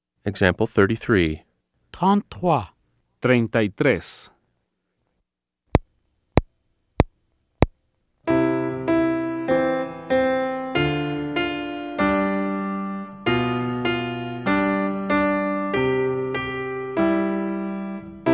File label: Keyboard